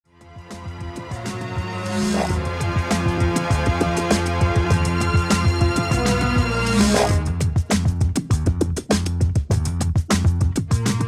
ts pitch 3 plus

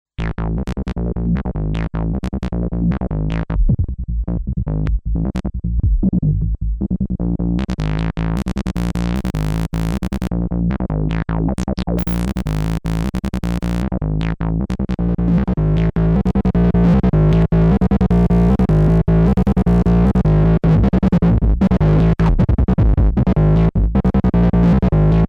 Korg Monologue bass Filter Drive; AFX

